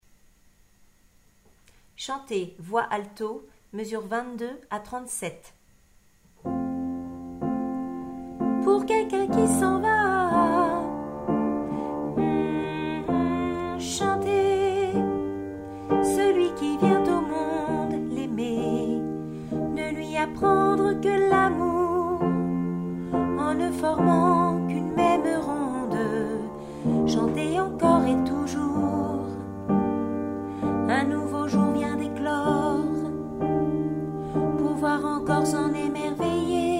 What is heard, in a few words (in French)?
chanter alto